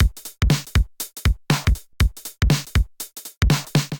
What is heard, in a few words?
707 Kit groove 120 bpm; MIDI Clips; 111 - 130 bpm; 707 Funky Beans Kit groove; Ableton; Factory Packs; Drum Essentials